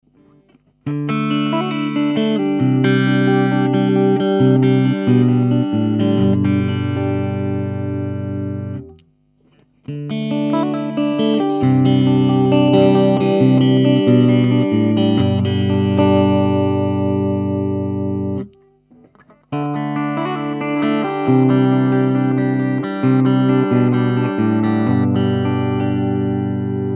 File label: clean